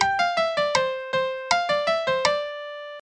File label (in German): melodie; MelodiediktateII